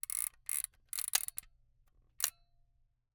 Camera, Aimex SP500, point n shoot, plastic, wind film, ping; Eiravaein Works; Vaeyan IV